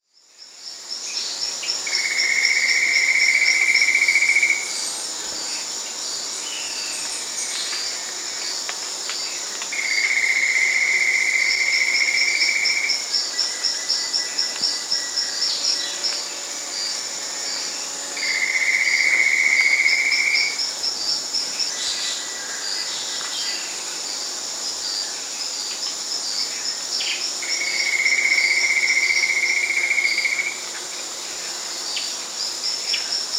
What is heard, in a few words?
Long-billed Gnatwren (Ramphocaenus m; S. America